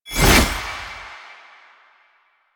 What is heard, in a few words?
Gunbrella Aim Transition In, Weapons; Warframe; Tenno; Koumei